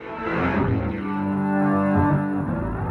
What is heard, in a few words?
Burning, Electric Guitar; Sonic Foundry (Sony Creative Software); Friendly Fire; Wrath